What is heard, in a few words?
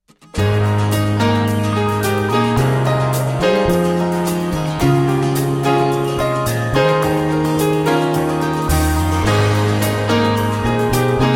Gospel Celebration Choir